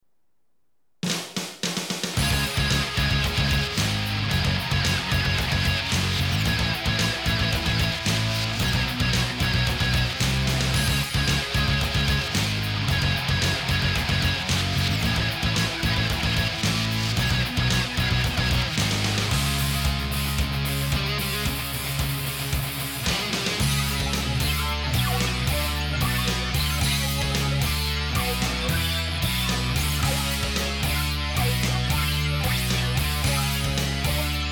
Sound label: Weird Atmosphere; Dragon Ball Raging Blast 2